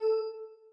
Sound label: button; Techmino